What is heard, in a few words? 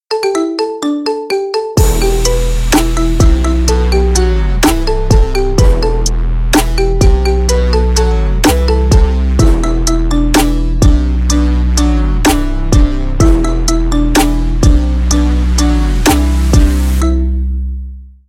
marimba remix